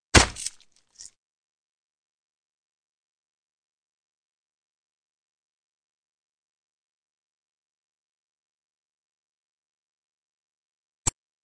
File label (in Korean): Fights, 싸움-전투; 헐리웃특수효과음